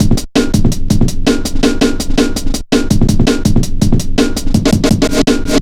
Drumloops, 165bpm; Drum Bass; Zero-G; Total Drum Bass

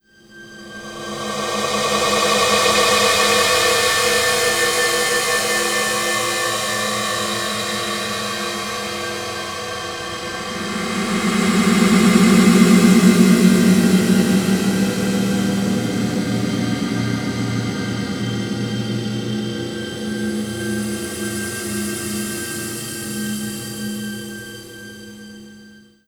Textural Soundscape, IDM; Sonic Foundry (Sony Creative Software); Twine-IDM

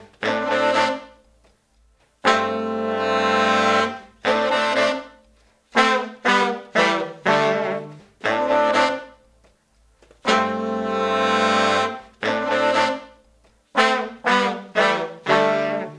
Horns, 70s Analog Funk

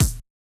BD Jungle, Yamaha DJX 2B

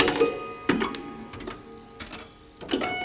Prepared Piano; Battery 3 Sample Kits

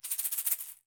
HDS ROL B, Percussion; Sampleheads; NYC Percussionworks; GJ PERC VOL2

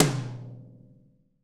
TOM, Roland, Real Kit; 90 s Sample CDs; Sound & Vision - Gigapack I CD 1 (Roland); Real-Kit s 6

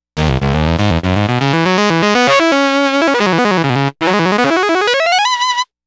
Sax Line; Dr. Fink's Funk Factory

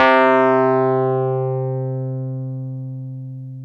PNO Wurly; Keyboards of The 60's and 70's

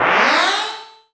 FX Dance

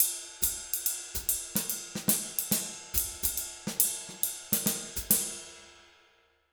Jazz Latin Drumloops, 140; USB Soundscan vol.08; AKAI

